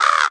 Rand Bird

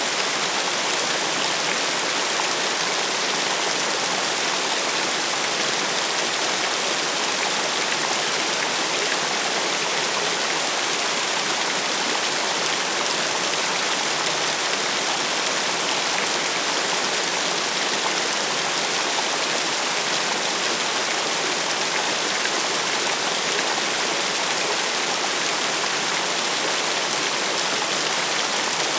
Waterfall Loop

